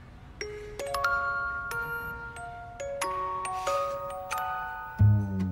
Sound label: sweet sms tone